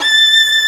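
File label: Vio legato A5; Digital Sound Factory Virtuoso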